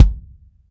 BD, Bassdrums, Roland TD-20

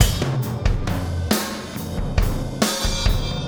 Impact Crater Beat, Processed Drumkits

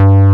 PULSE SQR; Pro-Rec - Synthsonic; JV ANALOG2

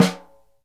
SNR, Synclavier Percussion 2 - World & Orchestral; ILIO

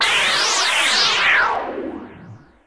Whoosh, sci-fi

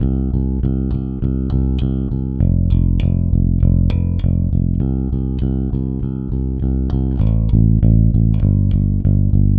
Rock, Bass; Bass X

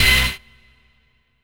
CHORDS; EastWest; Dance Mega Hip Hop Electro

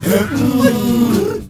MALE WAR, Heart of Africa, shout; Spectrasonics; Heart of Africa 1; CH. SHOUT2

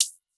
hihat squish; Polyend; Recue

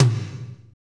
TOM, Drumscapes Roland

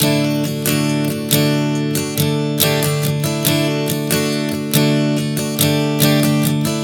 Strum 070 D, One Chord Strumming 070 bpm; Acoustic Guitar; Sonic Foundry (Sony Creative Software); Songwriter Acoustic Guitar Companion

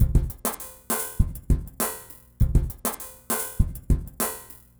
Remixed Drums 100BPM; The Roots Drumloops

